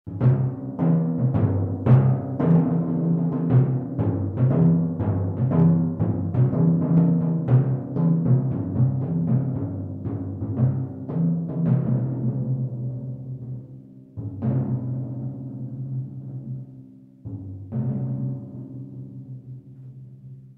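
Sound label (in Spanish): Timbales, Percusión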